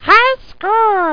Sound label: highscore; Geschicklichkeit; Mean Cuisine